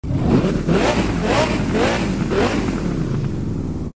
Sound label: Viper sound